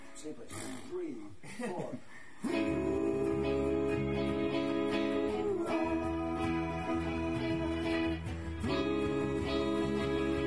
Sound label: Harmonies; vocal practice